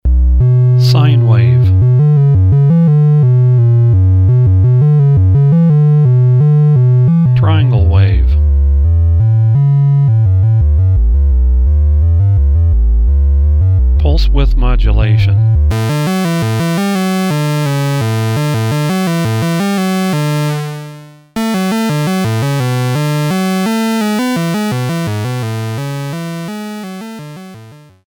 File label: vco; Thomas Henry